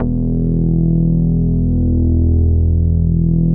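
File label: E1 ANA BS; Synclavier Sampler Library; KEYS AND GUITARS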